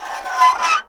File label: Scrape Metal On Metal